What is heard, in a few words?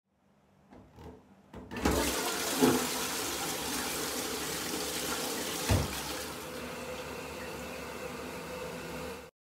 toilet